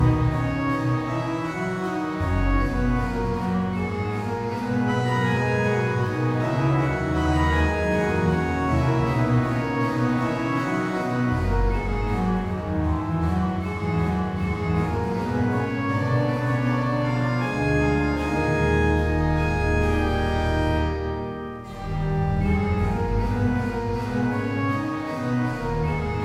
Sanctum Looping; BoroughBound Elseridge Academy

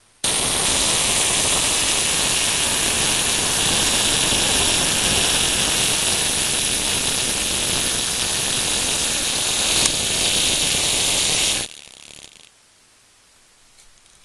camera self timer mechanism mono; Sonniss GDC

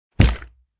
melee attack impacts bludgeoning; psfx

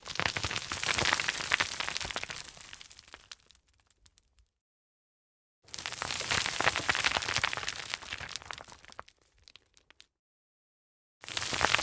crushed rock drop thick mono; sonniss gdc

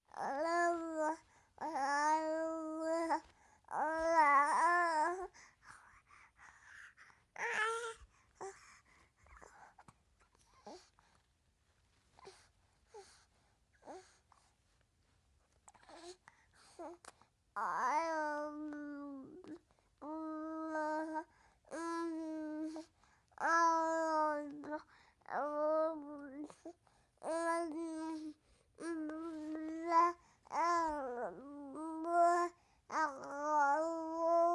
baby 5 months babbling whining happy annoyed; sonniss gdc

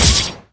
Autopod Impact; lancer weapon fx

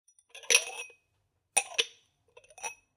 arranging glasses; sonniss gdc

